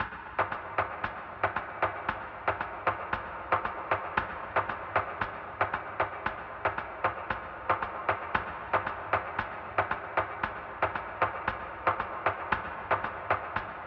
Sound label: 115 BPM G# Min Percussion, Latin; Loop Stems; Cymatics; El Jefe